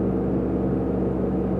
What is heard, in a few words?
Refrigerator; zps; Ambient